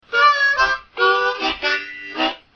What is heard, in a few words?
blues harmonica lofi, harmonica riffs